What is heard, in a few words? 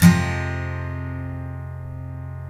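steel F minor down, CHORDS STEEL, Guitars; Spectrasonics; Hans Zimmer Guitars VOL-1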